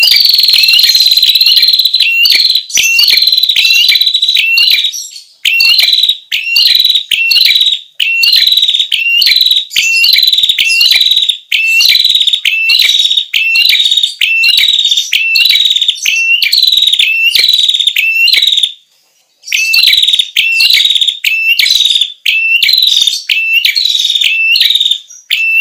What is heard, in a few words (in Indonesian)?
suara burung prenjak betina pikat